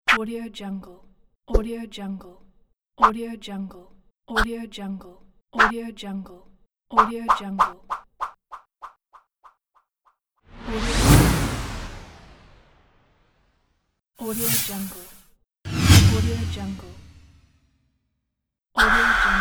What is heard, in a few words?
swishes